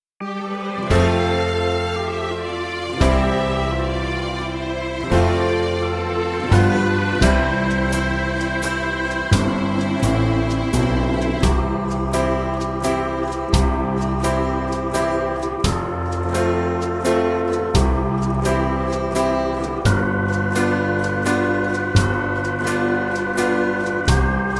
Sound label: No Backing Vocals